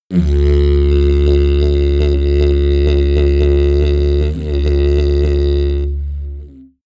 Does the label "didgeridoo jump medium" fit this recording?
Yes